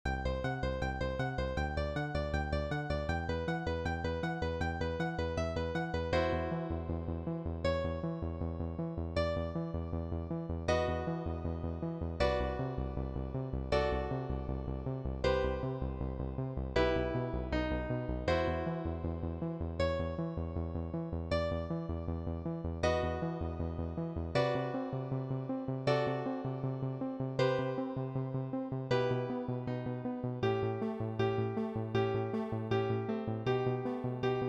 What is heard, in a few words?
accompaniment; flashdance vocal audition materials; KEYANO THEATRE COMPANY AUDITIONS